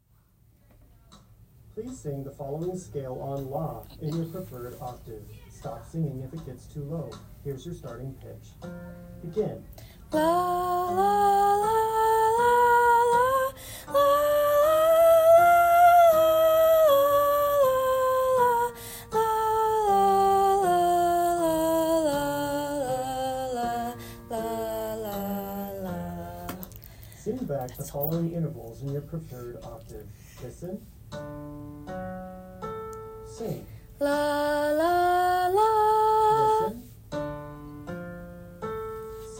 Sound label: soprano; Audition